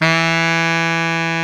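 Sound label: SAX Baritone Sax E 3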